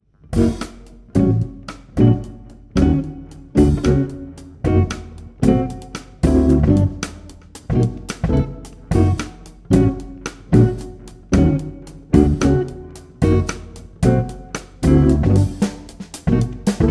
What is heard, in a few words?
Jazz